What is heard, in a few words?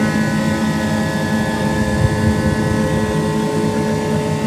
turret, tanks; World of Tanks